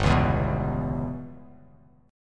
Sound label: G 6th Flat 5th, Bass Standard, G6b5 chord; G6b5 strummed; JGuitar